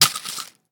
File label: Shell 12ga leaves; STALKER; IWP